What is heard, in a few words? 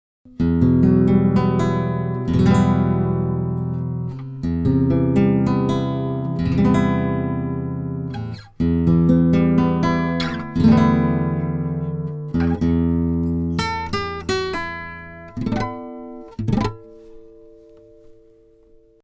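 Nylonstring cardioid; telefunken tf47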